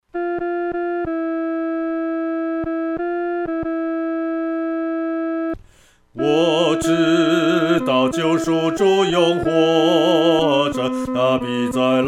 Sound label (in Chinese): 独唱 第二声